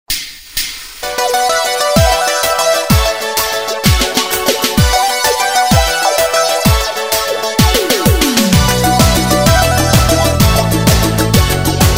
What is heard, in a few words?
Instrumental